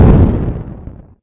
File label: explode, video games; starcas